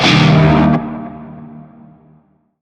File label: Shawzin Pent Min Chord; Warframe; Narmer